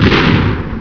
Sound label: CANNON